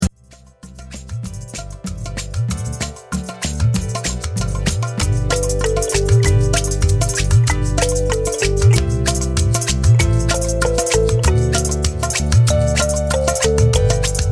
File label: jingle bells